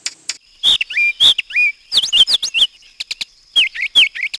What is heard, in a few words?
thrasher